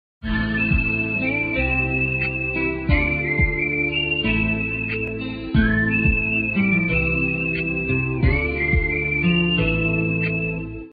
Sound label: love bgm ringtone telugu songs; venkatesh